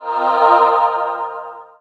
shrine buff

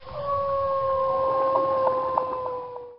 death, panther eye

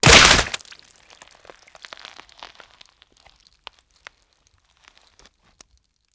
gibbed, debris

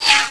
fusion flyby, weapons

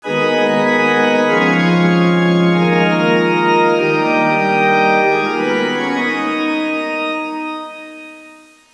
organ

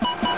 button ok; buttons

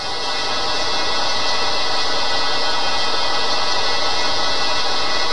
pipes, ambience

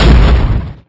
flare hit

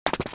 grunt step; hgrunt2

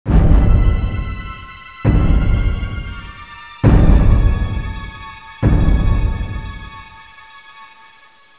suspense; tyrant

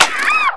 bitch slap

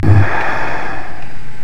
attack

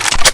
ppsh mag in

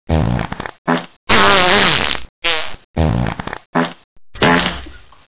farts

